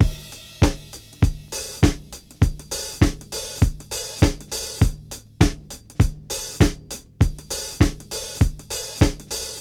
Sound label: 89 bpm breakbeat sample C# key